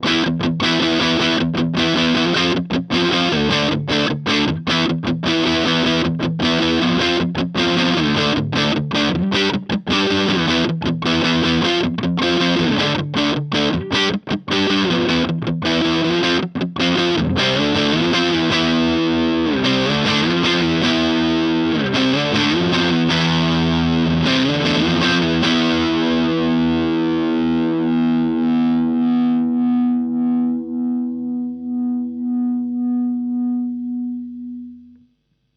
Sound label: Gibson Les Paul Studio Both